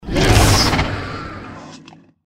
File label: gecko combat idle; npc